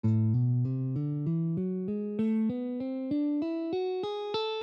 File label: A Melodic Minor, guitar